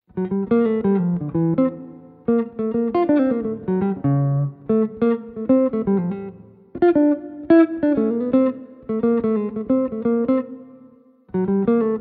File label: A section melody, jazz tunes